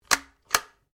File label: Rifle Anschutz 67 MSR 22 Calibre Bolt Action Open; A selection of domesttic firearms; Rifles